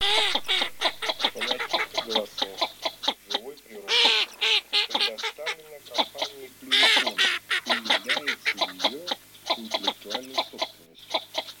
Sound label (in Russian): Широконоска Mestolone